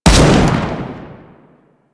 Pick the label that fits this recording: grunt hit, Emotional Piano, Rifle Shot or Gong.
Rifle Shot